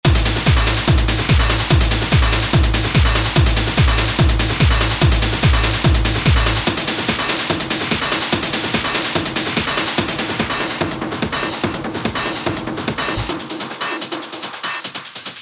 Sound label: Techno; Total Techno Bundle